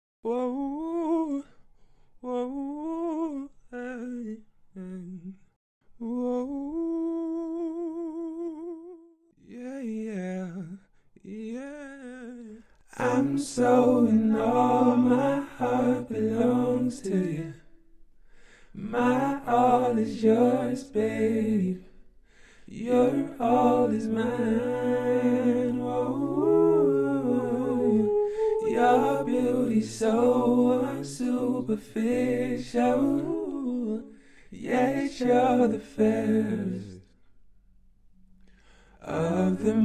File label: R&B 60 Bm, Acapellas